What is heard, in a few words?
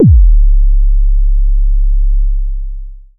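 dance bass drum; USB Soundscan vol.11; Drums Dance & Groove; AKAI; DANCE KIT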